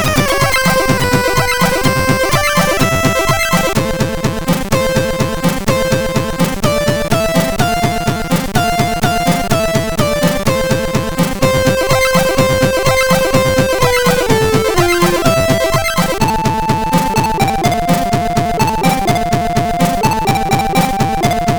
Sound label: Underground, sid MOS6581R4